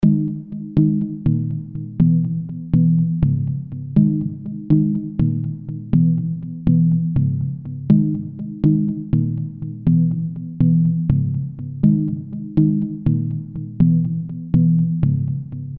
Afro Synth Loops Amin 122 BPM

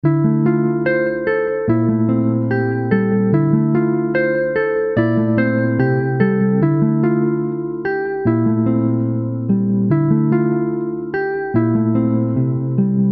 D min 146 BPM Guitar

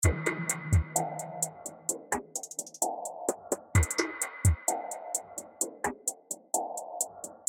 RnB Melody Loop Gmin 129 BPM Top Drums

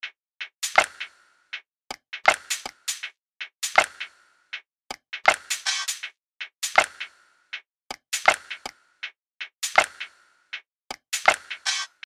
Lofi Loop Cm 80 BPM Top Drum

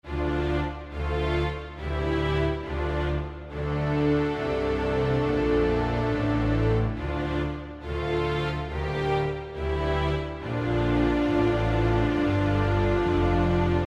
Drill String Loops Dm 139 BPM

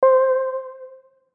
Keys One Shot

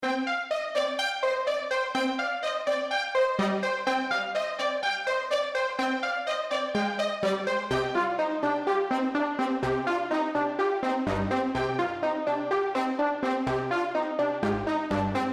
C min 125 BPM Brass

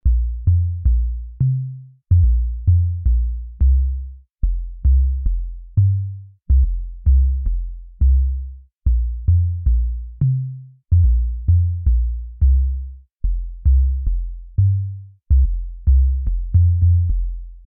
Afro Melody Loop Fmin 109 BPM Bass

